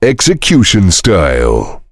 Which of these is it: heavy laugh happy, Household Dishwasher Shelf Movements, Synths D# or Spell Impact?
heavy laugh happy